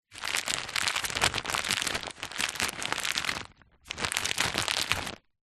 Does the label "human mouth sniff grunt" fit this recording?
No